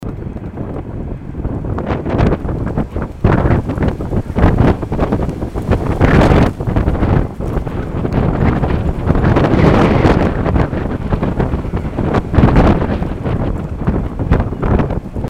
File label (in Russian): zvuk vetra